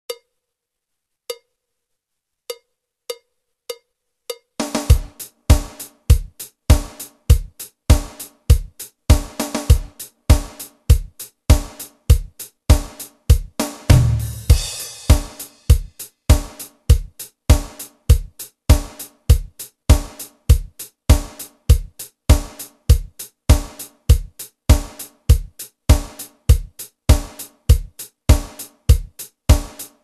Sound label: Drumtrack